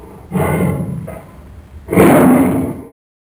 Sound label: hujiao; dongbeihu